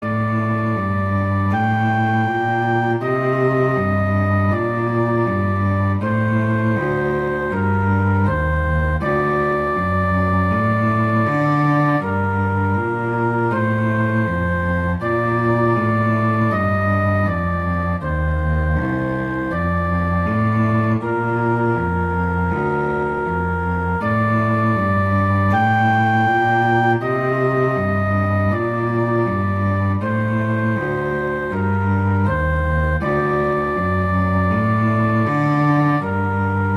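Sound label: Romanza, flute cello; Pleyel; Duets; Duet 4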